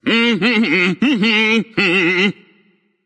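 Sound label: tongue, non-verbal; smite; voicepack; merlin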